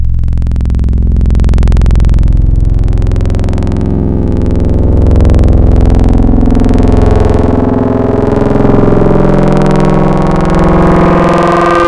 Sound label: Gimey, Serum Wavetables; Synthetic's Serum Collection; Cymatics - Nuclear for Xfer Serum Wavetables